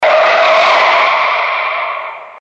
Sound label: braking